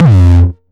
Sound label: 909 Dist Reso F2, Kicks; Drums; Mbase 11 Kicks